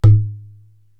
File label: Baya Open, Korg TR-Rack